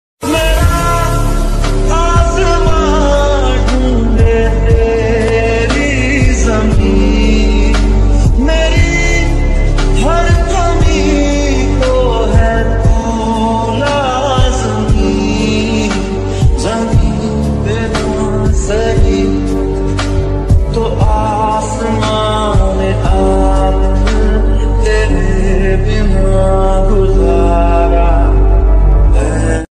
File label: love ringtone, hindi ringtone; love story ringtone